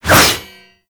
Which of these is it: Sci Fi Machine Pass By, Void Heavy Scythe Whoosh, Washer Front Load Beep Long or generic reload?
Void Heavy Scythe Whoosh